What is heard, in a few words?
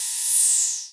Vermona Hat; techno drum fx; Analogue DrumHits